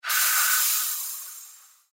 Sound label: Sci Fi Steam Release Engine Air; Communication; Blastwave